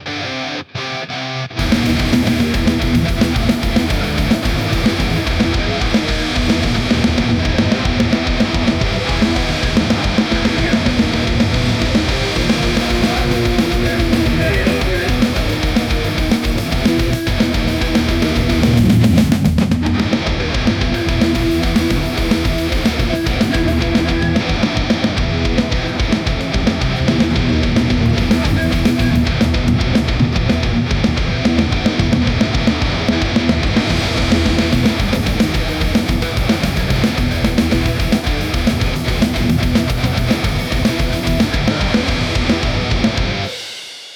eerie rock drums big hard, electric guitar

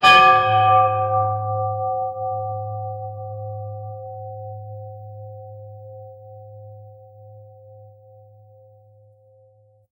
a large clear deep church

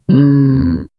a low tormented moan from, zombie

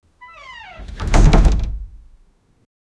scary wooden door opening; doors

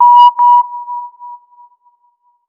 single beep sound sharp; interface

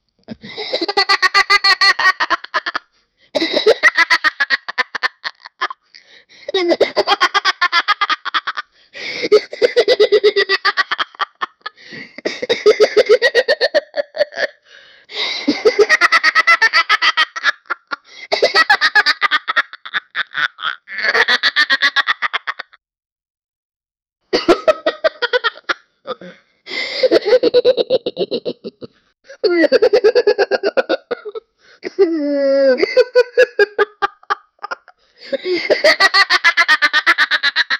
12 year old wolf man, chuckling

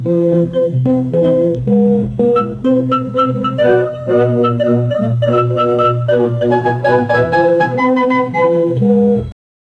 distorted circus music

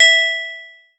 a bright plucked string

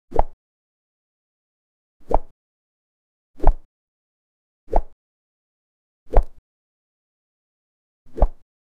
soft short card flip

